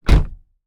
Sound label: 关上车门 Car Door Shut